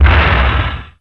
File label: Collision Ext